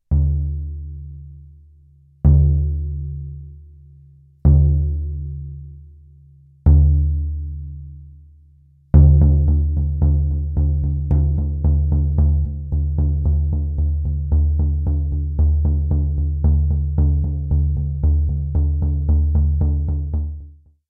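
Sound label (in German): Rehsound, Trommel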